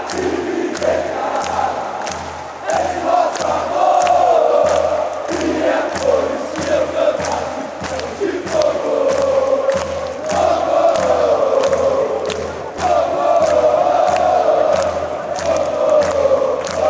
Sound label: ninguem cala, fan chants